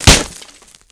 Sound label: glass; Mafie 1